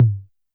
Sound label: Tom Ana Clean, YAMAHA RM1X